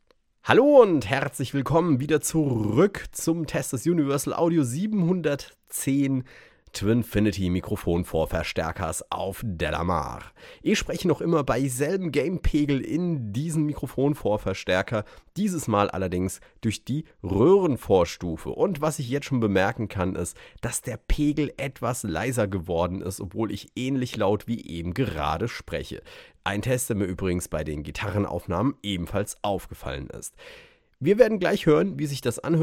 tube; Universal Audio 710

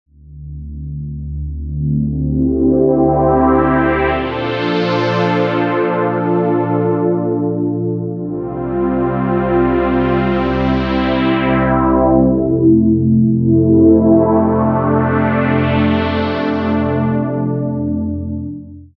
motu machfive 3 synth pad

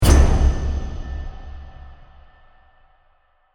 Space Pop Hit

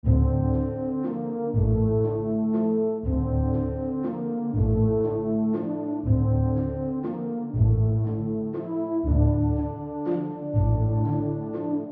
epic orchestra; vienna ensemble pro

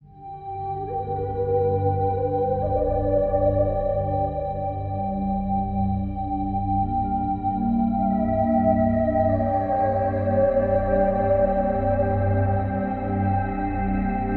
spherical; best service era medieval

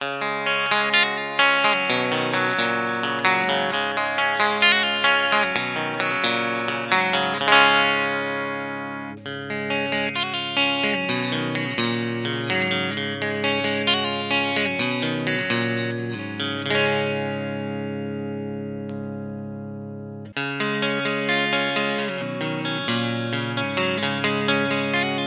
clean, asat classic nitro 3-ts

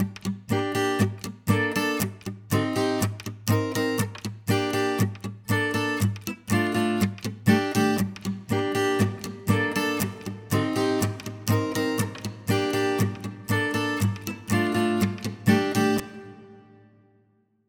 guitar; exponential audio r2

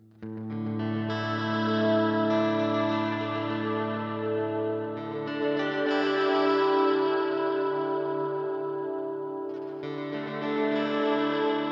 spring oldtape crinkle; strymon magneto; 4dlyheads evenheads

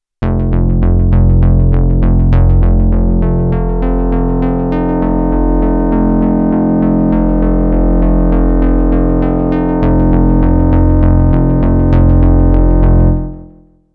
novation peak ana sync; novation peak test

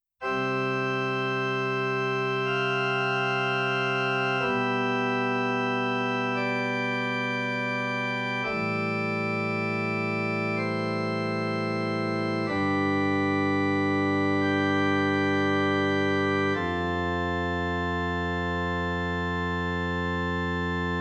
pipe organ

